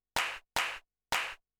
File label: CLAP HOUSE 125; eJay; Drum